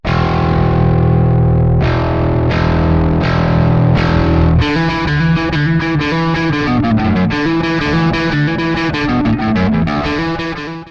bass drive solo

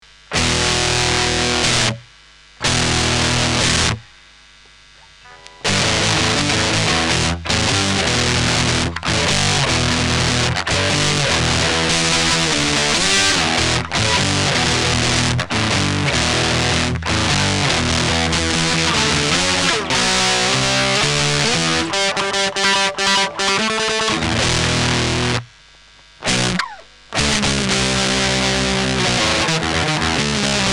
High-gain

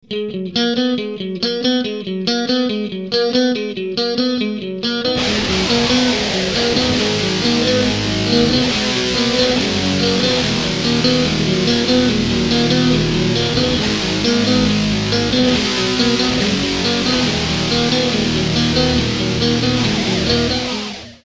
SANS AMP GT2

